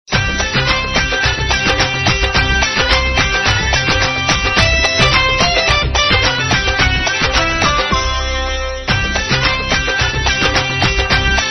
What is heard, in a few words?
punjabi dance mix ringtone